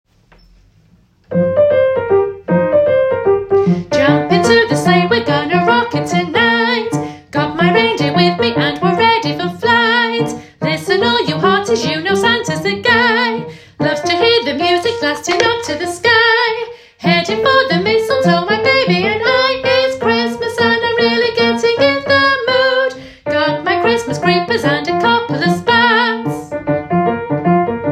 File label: alto